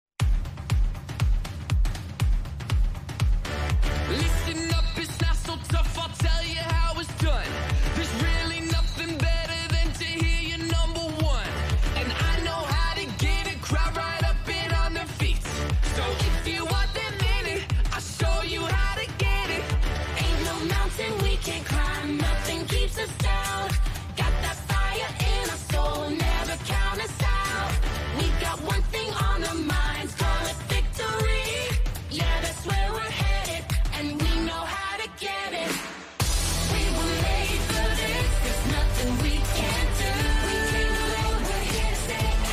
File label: Jazz; Fired Up